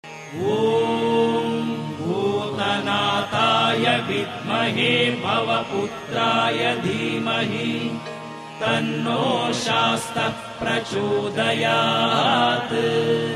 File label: ayyappa gayatri